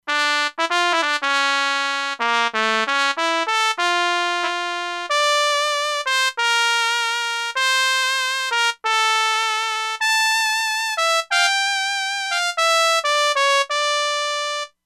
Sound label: Trumpet; E-mu Proteus-2